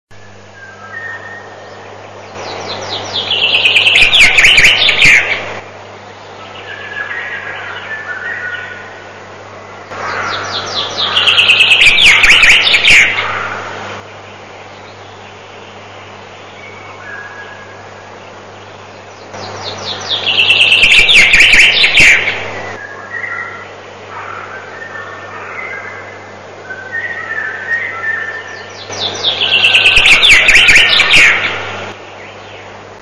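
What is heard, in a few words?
zyablik voice, chaffinch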